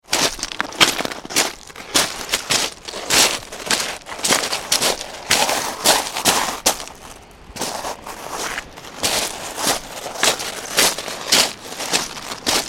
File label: Pebble Beach Footsteps, Walking on stony beach various; Footstep Sound Effects; Human